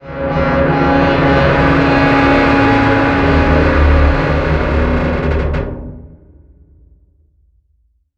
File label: damage vehicle; World Of Warships